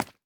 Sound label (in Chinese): 蜡烛破坏声